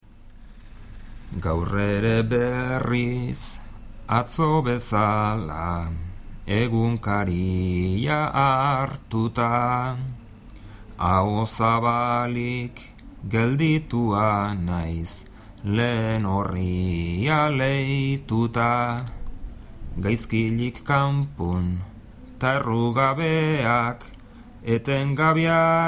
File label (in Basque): bertsos